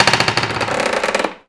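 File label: boat creak; The Elder Scrolls III - Morrowind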